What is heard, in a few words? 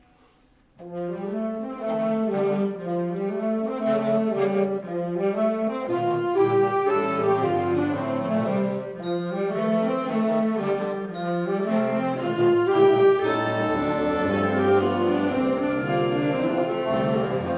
Childrens March, concert band; Percey Grainger arr Wagner